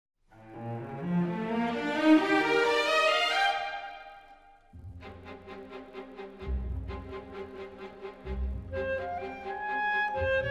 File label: two clarinets